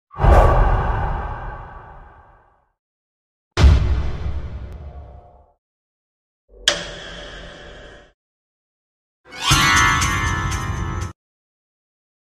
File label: impact scary hits; chikn nuggit